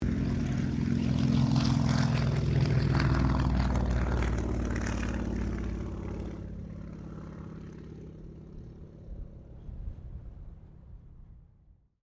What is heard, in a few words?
AIRCRAFT T-28D TROJAN PASS